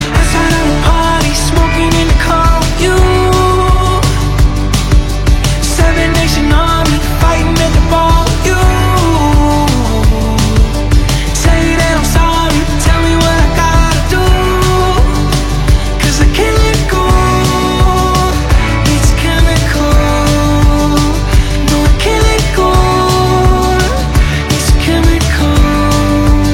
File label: ringtone